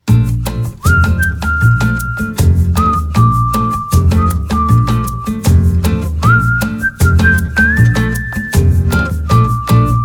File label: Instrumental; rain rain go away